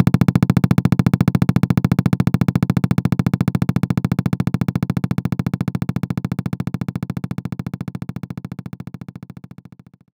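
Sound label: Guitar Slap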